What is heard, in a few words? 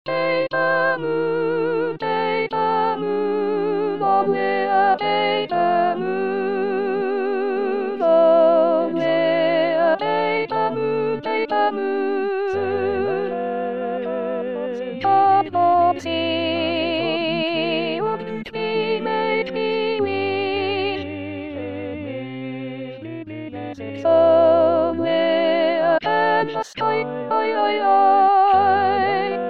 Soprano